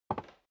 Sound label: step sneaker wood creak single right; schritte